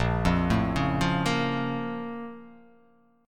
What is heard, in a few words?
Bb Minor Major 7th, down; BbmM7; arpeggiated; JGuitar